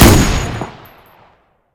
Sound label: candy fire; candygun